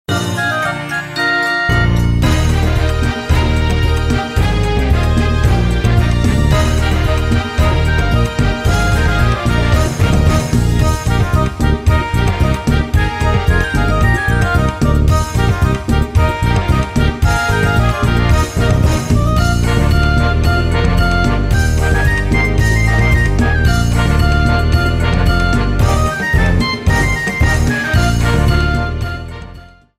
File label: Clockwork Castle Night